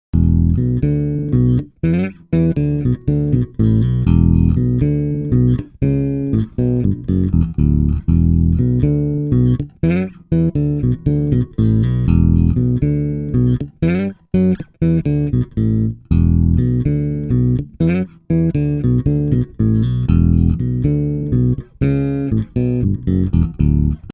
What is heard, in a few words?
octabass